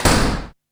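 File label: DOOR CLOSE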